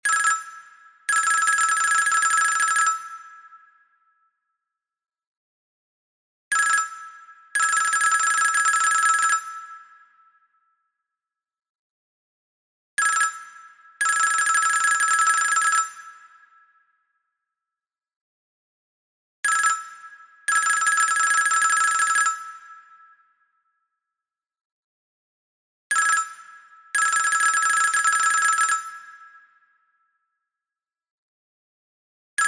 Telephone arubien

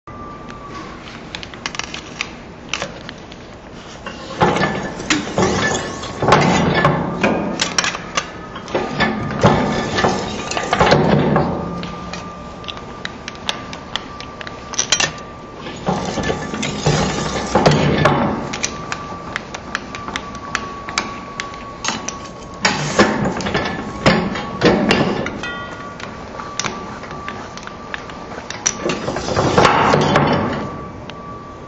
Rouleaux

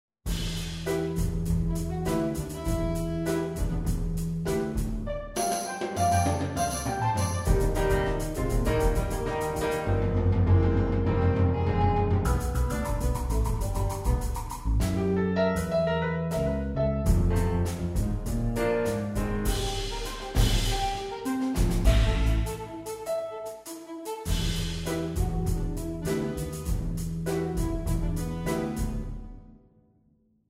Pot pourri pour orchestre

